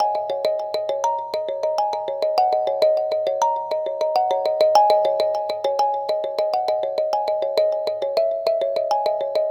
Mbira Hi; Melodic; Ambient-Melodics-II

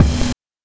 BD + HAT; NES DPCM; MIDINES

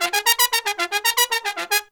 Pop & Funk Brass, R

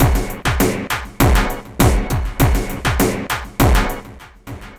xtra tech beat; Sonic Foundry (Sony Creative Software); Ugly Remnants VOL-2

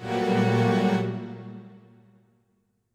string stab

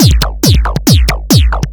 drum 138; Best Service ProSamples vol.45 - Techno ID; drum-loops; xtra-drumloops

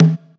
Conga; Equipped Music; Loungin House

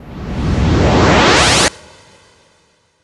NOISE, Production Effects

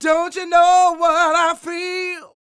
SING, Vocal Planet Blues Soul BLUESY

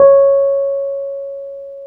PNO, Wurly

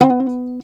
SHUR NOTE, TOPSHUR NT

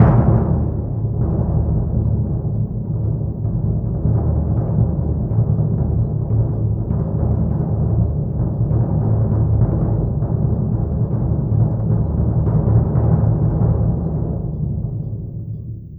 Bass Drum, Orchestral Series Rock and Pop

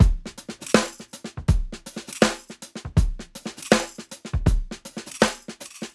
SIX POLY TIGHT, TIGHT KIT; Tuna Beats

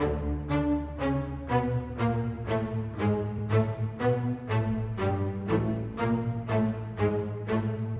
Strings; Sonic Foundry (Sony Creative Software); Orchestral VOL-2; Modern 01

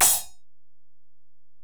Ride, Alesis DM-PRO

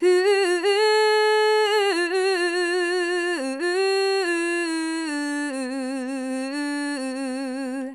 Female vocals and robo-vox

